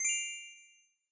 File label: bonus tokens appear; Skillzgaming; fruit Blast 92; vampire